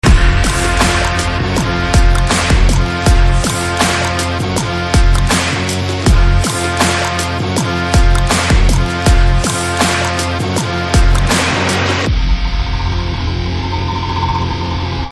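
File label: background Theme Telugu Ringtone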